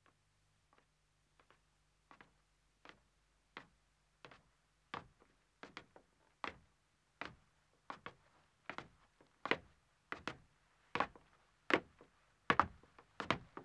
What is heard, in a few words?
Wooden Stairs Ascending, 2 Men, Footsteps; BBC Sound Effects Library